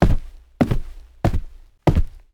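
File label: carpet normal footsteps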